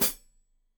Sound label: Hat Foot, Hi-Hat, Cymbals Stereo